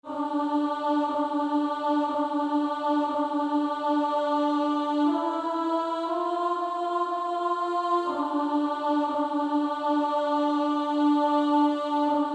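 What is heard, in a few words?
Female Choir, alto 2, Practice Music; Coventry Carol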